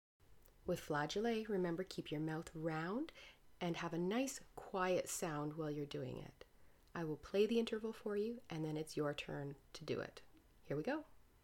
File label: flageolet